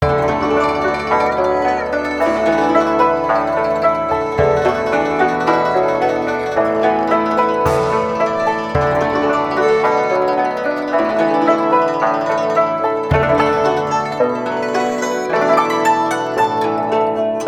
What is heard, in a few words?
Chinese New Year Music; Year 5; dodderhill; british council; chinese new year celebrations